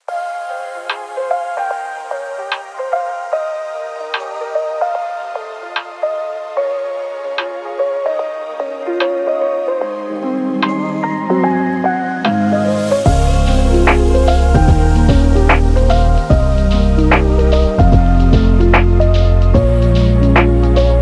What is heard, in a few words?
Happy Lofi